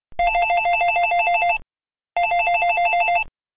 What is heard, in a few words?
ringer, ringtones